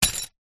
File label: impacts thud light into loose small metal pieces